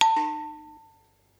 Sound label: Kitchen Pling; Synthetic's Serum Collection